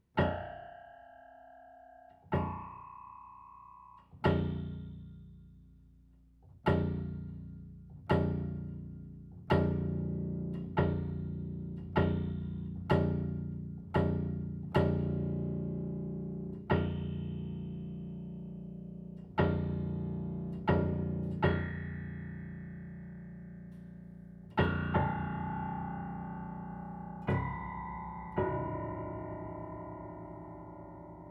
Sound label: Multiphonics of the grand piano, flageolets